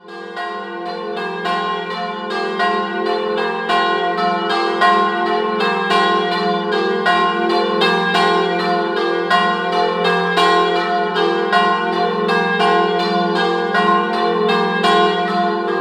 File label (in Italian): Sedrano, campane